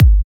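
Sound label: Bass drum Electro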